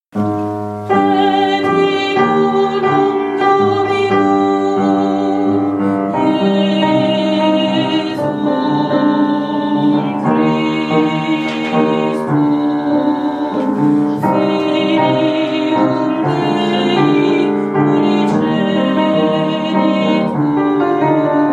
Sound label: credo alto